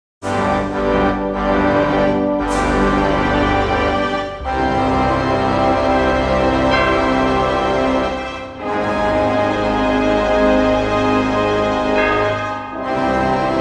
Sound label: Fanfare